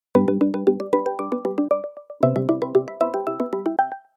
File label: incoming